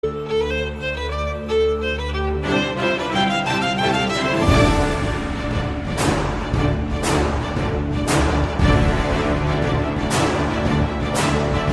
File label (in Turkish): keman zil sesi; cep telefonuna keman sesleri